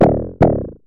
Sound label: Synare Filter; Star Instruments Synare 3